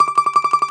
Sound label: DOMRA D, Prima Domra, Plucked Strings; E-MU Producer Series Vol. 7 – Old World Instruments